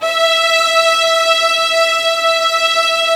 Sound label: A.C STR E4; AKAI S-Series CD-ROM Sound Library VOL-8